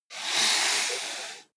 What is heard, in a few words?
computer mouse draw long